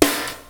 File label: sd acoustic